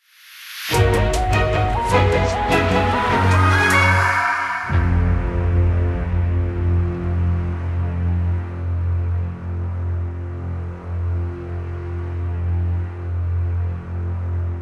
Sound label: Victory Jingle 76 4-4